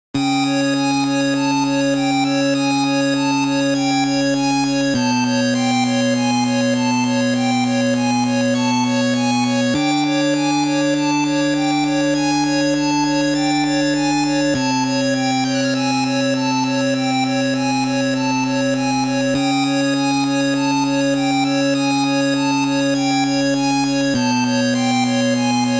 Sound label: 8bit Dungeon